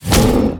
Whitemane Attack

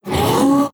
Howler Death

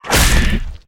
Banana Bunk Attack

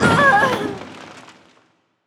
Cage Of Pain Death

